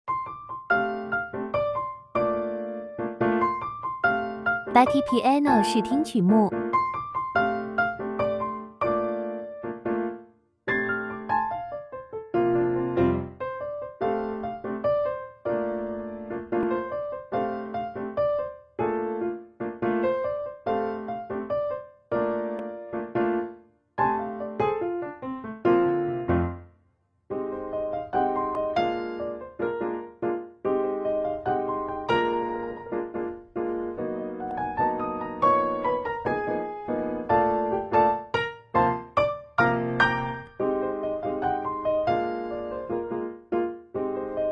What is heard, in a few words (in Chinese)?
Pop bossa, 拉丁前奏曲